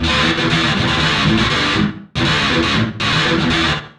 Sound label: GTR LOOPS